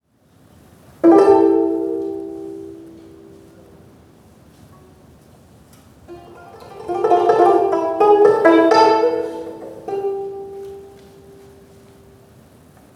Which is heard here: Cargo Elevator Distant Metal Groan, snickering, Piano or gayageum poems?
gayageum poems